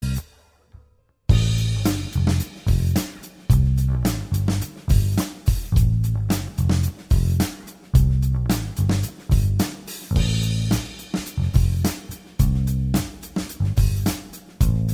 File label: whistle